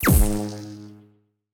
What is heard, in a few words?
throw ball shock charged; CrossCode; battle